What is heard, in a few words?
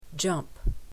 jump